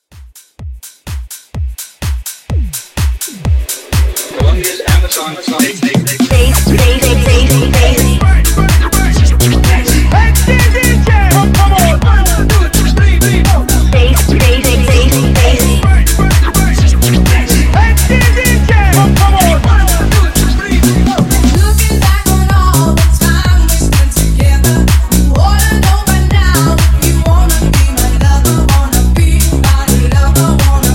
House Scratch Hype Edit

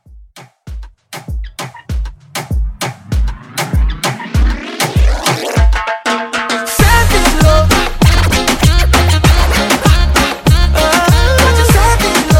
Tiktok Moombah Hype Re Drum 98 CLEAN

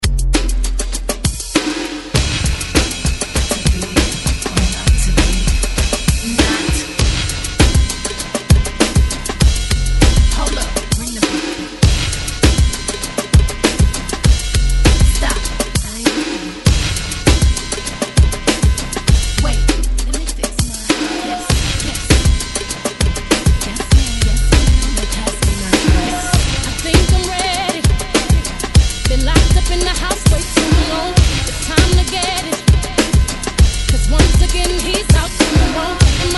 ReDrum 99Bpm

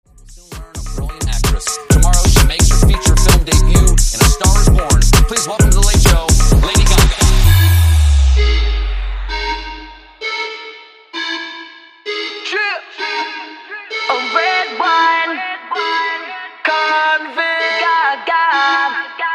2000s EDM

